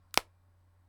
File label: machine; 携帯閉じる１